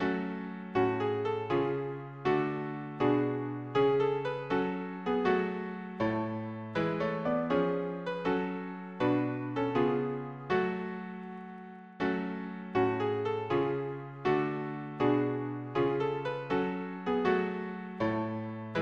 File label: harmony piano